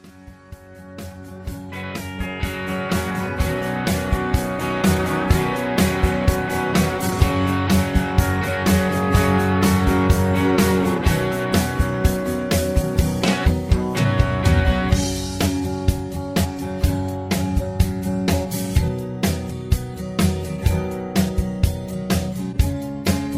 Instrumental Lower

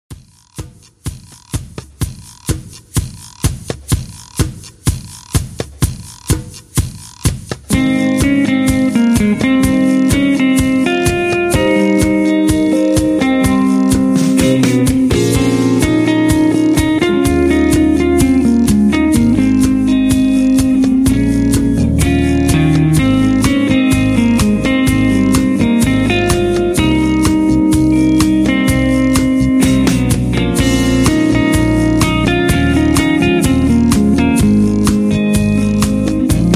Sax Break Full Leads with BGVs

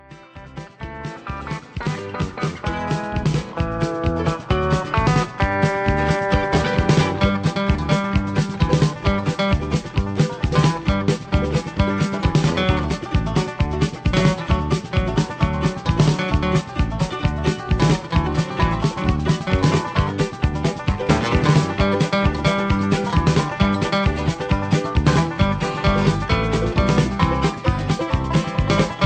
Instrumental

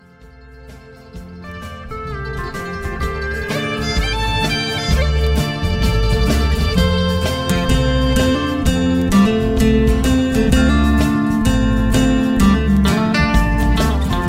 High Key

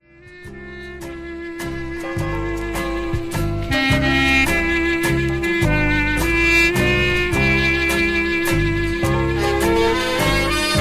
Waltz Instrumental

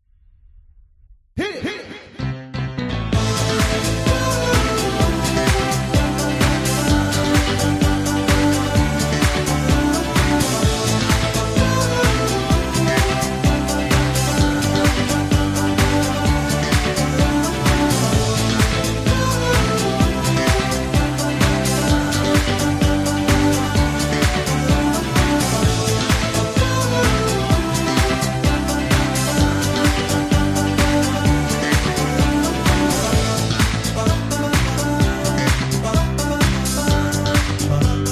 Patter

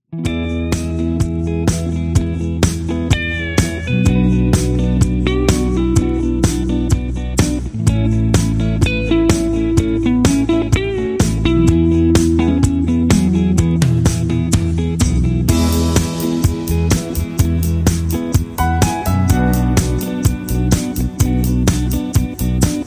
Instrumental No Leads No Solo

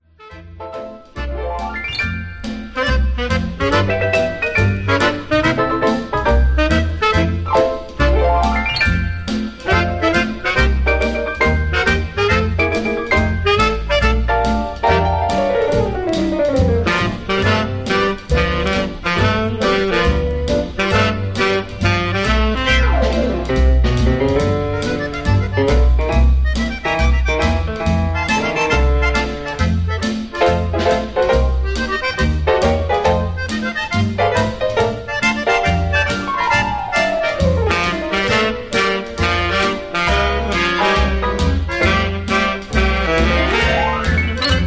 Two Step Instrumental

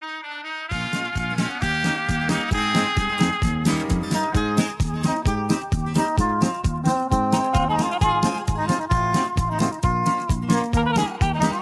Instrumental High Key

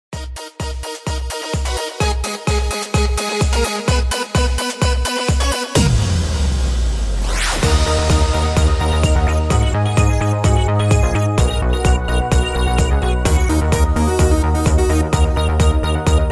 Patter

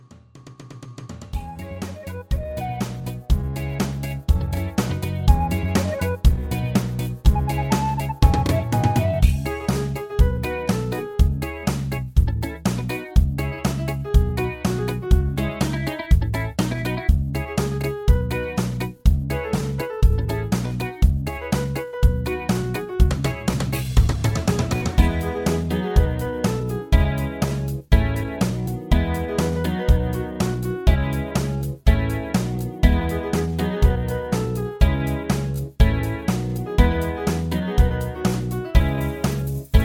Instrumental Higher; Singing Calls

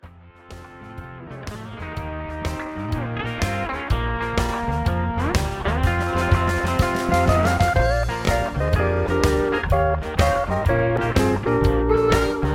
High Key

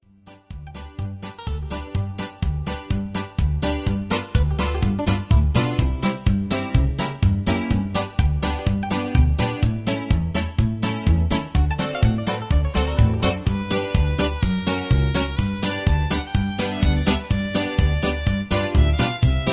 Patter

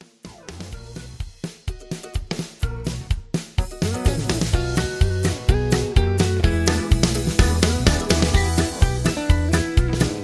instrumental

